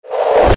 knocker attack

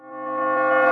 silence

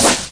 storm slash

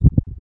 peco egg heartbeat

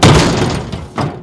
earthshaker

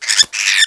hornet die

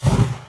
ash toad stand

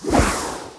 soil attack; increase soil

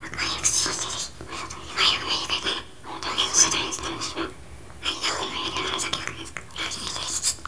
mutter

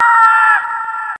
chimera fillia die

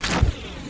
spark attack

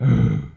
mummy attack

